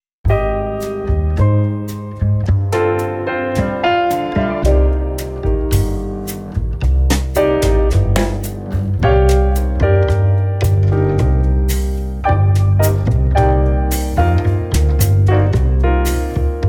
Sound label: Medium Latin Bossa, flutist; chapter 2 the minor blues scales